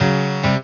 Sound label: klavichord; sound-icons